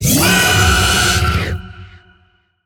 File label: Vocal Attack Short; Warframe; Zariman; Void Angel